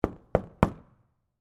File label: Door Knock Plexi, Foley, Door, Knock, Plexiglass, Window; BlastWave